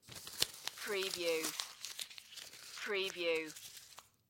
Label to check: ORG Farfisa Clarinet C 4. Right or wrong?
Wrong